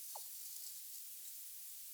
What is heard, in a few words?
source signal, recordings under ice